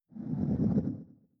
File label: ENEMY AGGRO; Agni